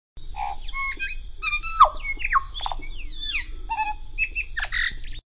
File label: Tui